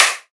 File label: trap clap sound clip a sharp key